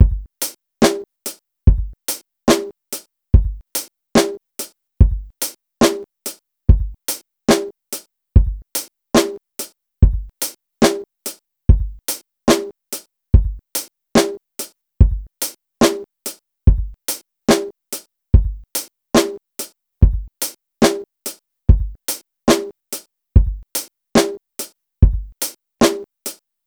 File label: Drum Kit, Electro Lounge; Sonic Foundry (Sony Creative Software); 072 Green Street